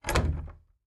Attic Closet Door Clo

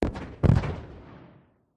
2x16 Oz Black Powder

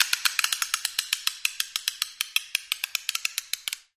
Thumb Screw Turns, gears

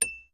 Elevator Tones

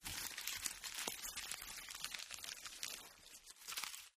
paper crumple; paper mvmt

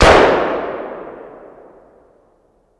Gun AR-15 Single Shot Fire

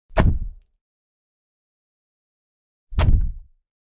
Hit In Microphone x2; hits & impacts

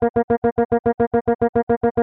Ascending Xylophone

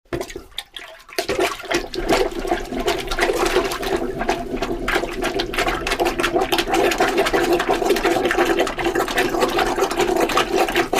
Toilet Plunging

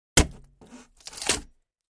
Axe Paneling 1 Side, axe hits

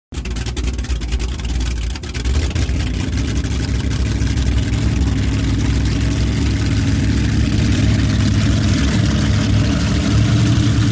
Power Boat Idle Away, off shore power boat

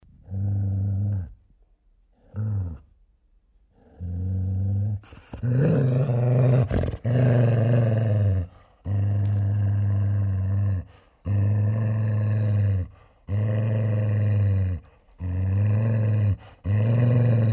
Angry Lrg Dog Growl Sn

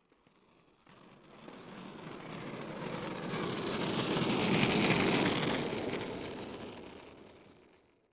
Roller Blading Coas

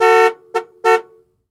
Jeep Cherokee Horn Honks, 1 Long, 2 Short

